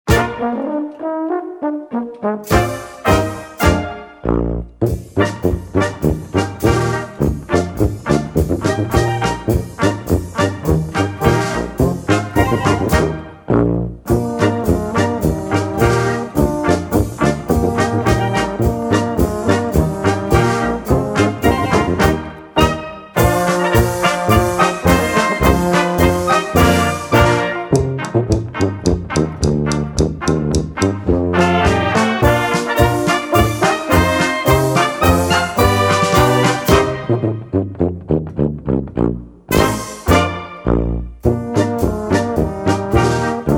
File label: Tuba